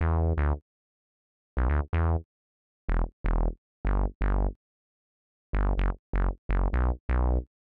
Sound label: 125 res synth bass E; PowerFX - Nu Jazz House